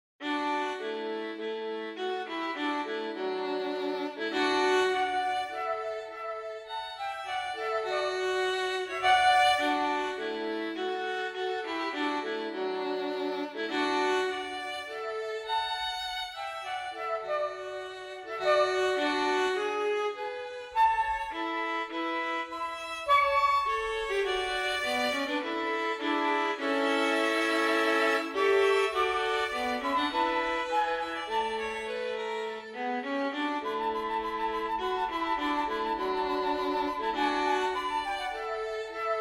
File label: Serenade Flute Violon; Sixième mouvement : Adagio; Ludwig van Beethoven